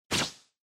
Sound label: Motion Agility